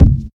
good kick drum one shot C key, bass drum sample tuned to the C note